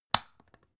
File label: folder clamp open